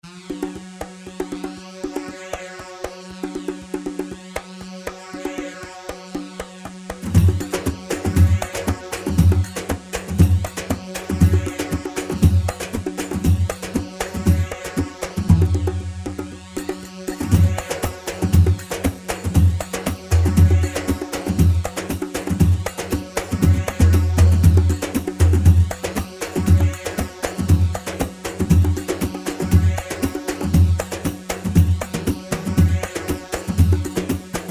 Maalaya 4/4 236; معلاية

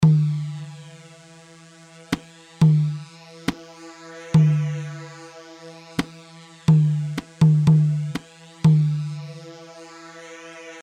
Tabol C; طبل

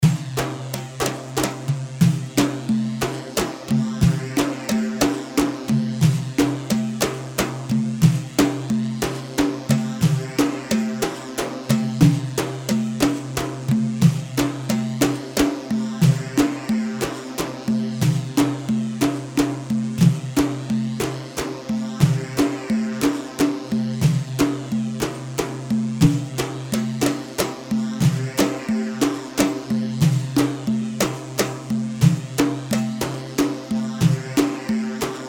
3ashouri 3 4 90; عاشوري

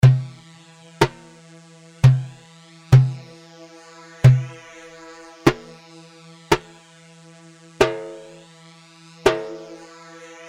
Merjaf; Merjaf 4 مرجاف

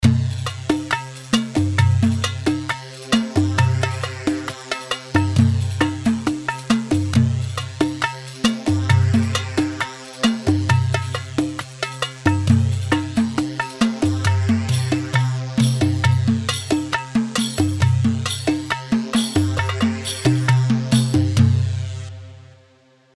Rhumba 4/4 135, طرب; رومبا

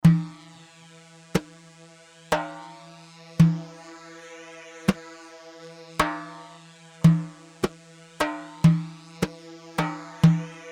Tar; طاره

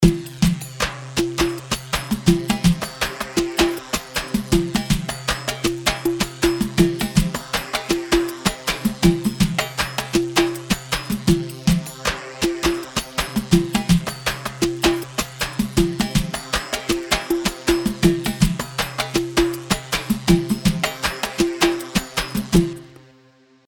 Swehli 3/4 160, khaleeji

